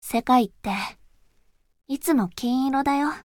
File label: applause